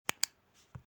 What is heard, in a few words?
click pen